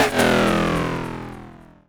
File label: Stretch; Jungle; FX; Blu Mar Ten - Jungle Jungle - 1989 to 1999 Samplepack